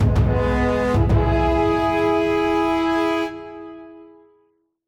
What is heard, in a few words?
Combat Arena (Win)